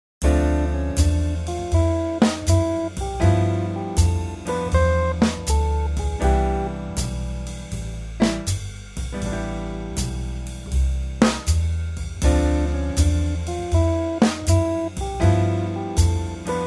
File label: Scale; Tomosax